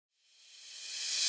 Reverse Crash; Cymatics; Orchid Sample Pack

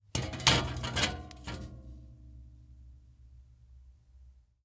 Hands On Metal Rail; Fairlight Sound Lib

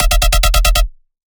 Bass Shot E Anger, Dubstep; Ghosthack; Advent Calendar 2018

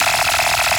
Techno Synth Shot E; Synth One-Shots; Ghosthack; 45 Free Techno Synth Shots 2025

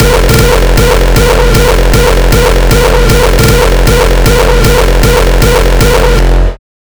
kicks loop 155; GhostHack

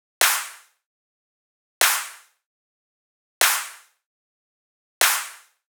Dubstep Drum Loop Snare, 150bpm; Full Drum Loops (Stem Seperated); Ghosthack; Dubstep Sound Arsenal

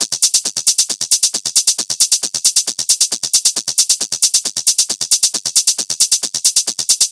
Hat Loop 135, Techno; Ghosthack; Techno Sounds 2025

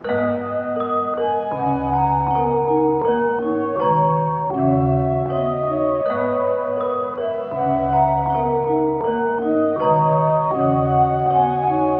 Melody Loop 160 BPM G# Min, Hip Hop; Melodics; Melody Loops; Cymatics; Cobra Hip Hop Sample Pack

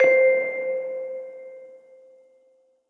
BELL Phantom (C); Melodic One Shots; Cymatics; ANALOG EVOLUTION Beta

